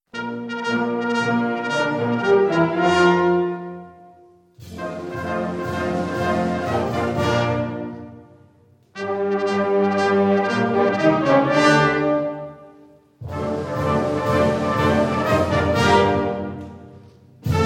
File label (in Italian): Fanfara Inno Nazionale; Fanfara Scuola Allievi RM; 2012 Concerto Carvaglios